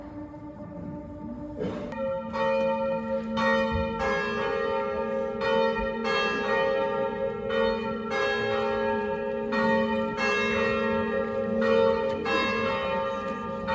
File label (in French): OBSEQUES SONNERIE CLOCHES; Sonnerie de cloches à la fin